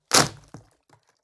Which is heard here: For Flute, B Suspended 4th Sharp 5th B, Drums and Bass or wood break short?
wood break short